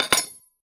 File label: chest open close; Recode; jewel Quest Riches Desktop